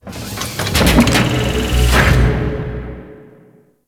Ship Metal Mech; Warframe; Corpus Ship Remaster

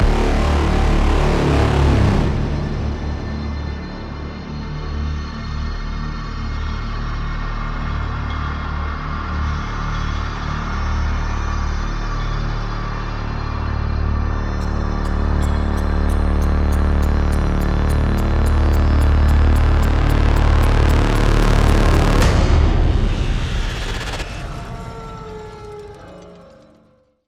Last Stand Cue; Warframe; NineteenNinetyNine; Stage12PartyOfALifetime

